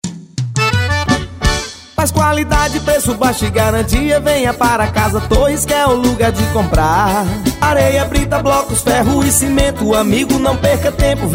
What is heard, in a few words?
JINGLE; CASA TORRES; Mat. de Construção